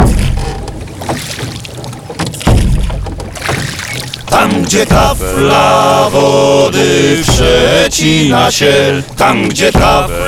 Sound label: unmixed; mix-mastering